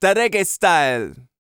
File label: REGGAE, Vocal Planet World Voices